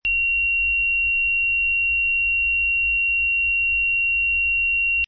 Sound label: ultra loud continuous